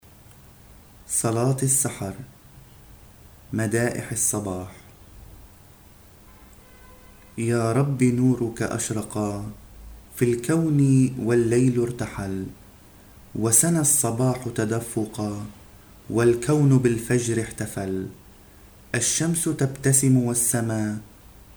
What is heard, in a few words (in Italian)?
Inno, arabic mass; 1a Settimana Lodi